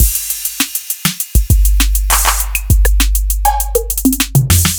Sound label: acoustic 808s kit; by uppercussion